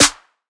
snare nose; Hip-Hop; Drums; Drum One Shots; Slate; CAICOS Hip-Hop Sample Pack

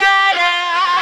Omnivox oneshot F, Vocal; Slate Digital Omnivox Vocal Sample Pack

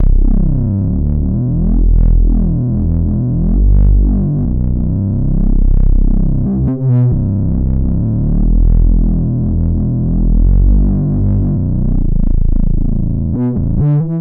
Synth loop 135 Em, Synth Bass Loops; Slate Digital BASSHOLE Sample Pack

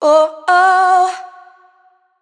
adlib Oh Oh wet, vocals; Slate Digital Omnivox Vocal Sample Pack; Adlibs

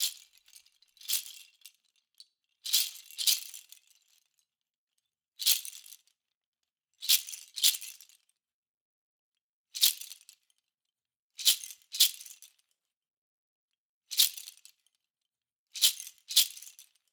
rattles loop, 110

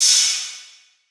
cymbal flash, Hip-Hop; Drum One Shots; SD CAICOS Hip-Hop Sample Pack; Slate

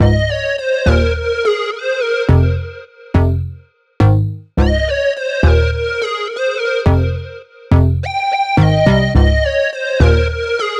songstarter 105 Fm; Slate; SD PASADENA Pop Sample Pack

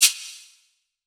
perc metal shaker; Drum One Shots; SD MIDNIGHT Sample Pack; Slate

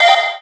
tonal oneshot F; Pop; Slate; PASADENA Pop Sample Pack; Tonal One Shots

